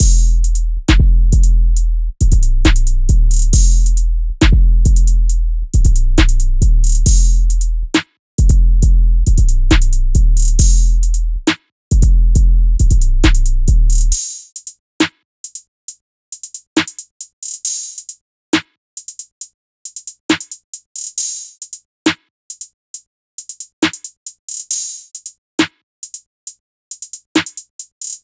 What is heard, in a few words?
136 C Minor